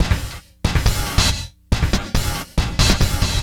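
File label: Saver Loop, beats; Sonic Foundry (Sony Creative Software); Esoterik Beatz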